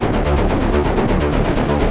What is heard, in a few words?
D1, SynthLine; 90 s Sample CDs; NiceBeats; SynthLine VOL-20